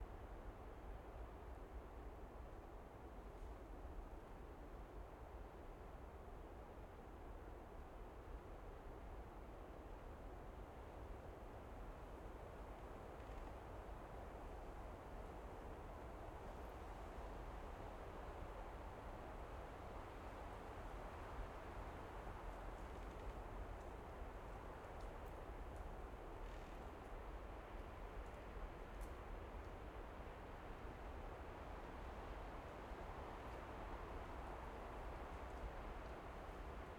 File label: Calm wind in pine tree forest; Baltic; quad; HERZ; Baltic quad surroundings